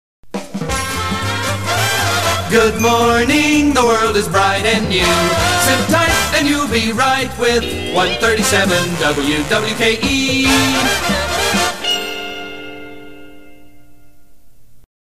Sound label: jingle Good Morning; WWKE